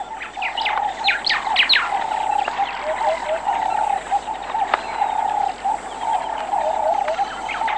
savannah, hotlands; Zero-G Ambient 1

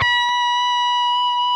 PRS C, Guitars, LEAD

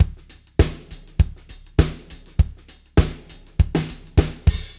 disco 100, Beat Loops; Zero-G; Monster Beats

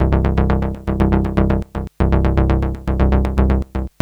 moog seq; AMG - Vince Clarke Lucky Bastard

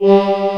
VO G3, ANA VOX, SYNTHESIZER; AKAI S6000 CD-ROM - Volume 1

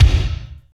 KICK, Tekno Industrial; East West

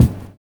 LOW TOM; Univers Sons - Kitch 70s

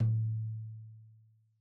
Tom2 Stereo, Deeply Sampled Drums

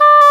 WND ENG HR, English Horn; Roland LCDP04 Orchestral Winds

